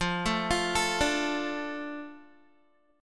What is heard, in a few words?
F 6th F down Guitar; F6 arpeggiated